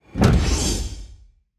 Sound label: Door